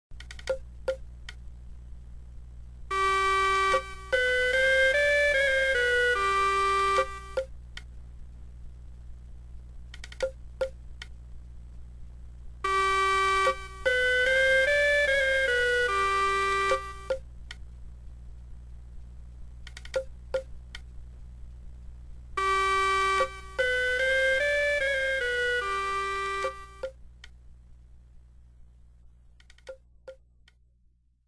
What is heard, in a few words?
music